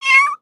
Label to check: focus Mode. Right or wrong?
Wrong